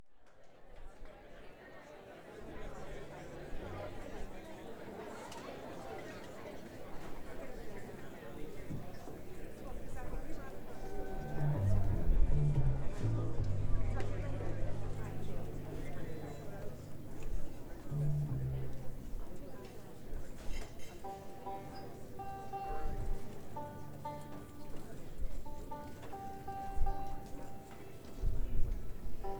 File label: Tuning; Bluegrass